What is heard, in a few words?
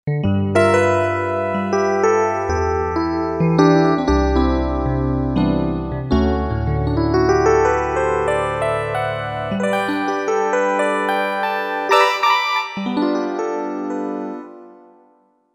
E. Piano, Korg MSC-1S; Korg MSC-1S Variety